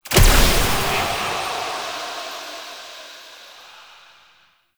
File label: Dissolve Smoke; Warframe; Mods; Peculiar Mod